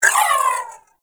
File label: Briefcase Akimbo Pistol Alt Proj Whizzby; Warframe; Corpus